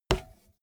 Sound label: bmx bike tire kick